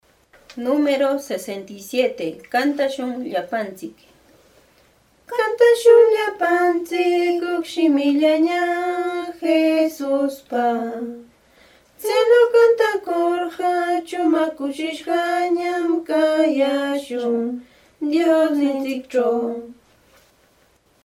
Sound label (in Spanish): cancion